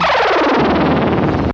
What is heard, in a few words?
Electro FX; Ueb HE Electro FX